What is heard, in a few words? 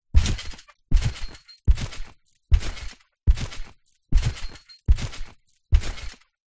trampoline bounces jumps close up underneath